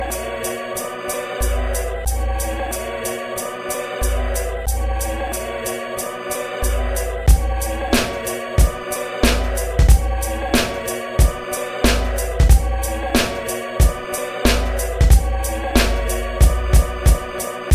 underground beat

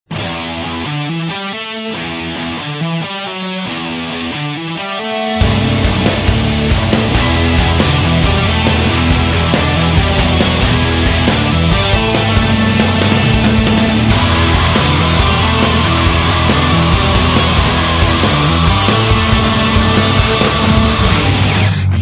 guitar solo

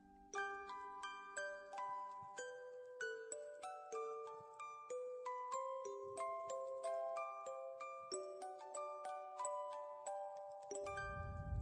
music box

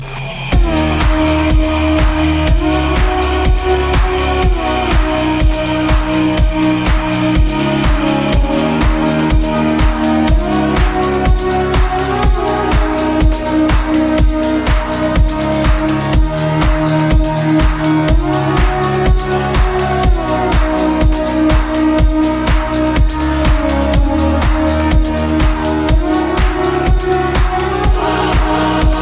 trance ring

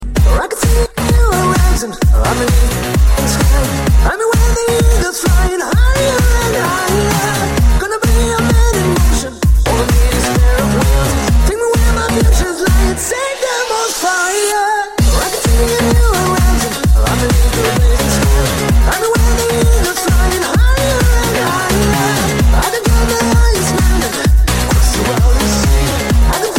grooves

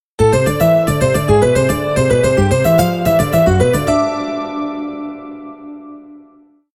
keyboard riff

